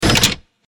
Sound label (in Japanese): 大型ロボットの駆動音